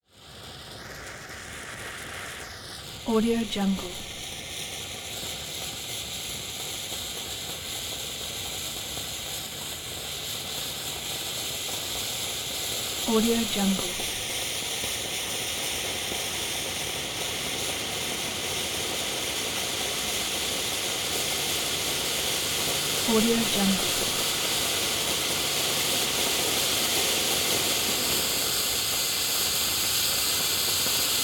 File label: fireworks sputter